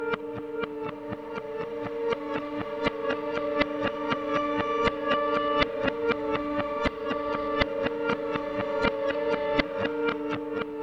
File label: FLUTTERING, Bizarre Guitar; Spectrasonics; MYSTICAL